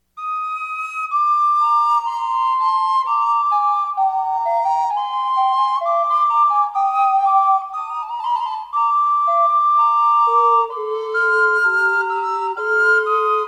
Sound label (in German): Vom Himmel hoch fughetta SATB; St Pierre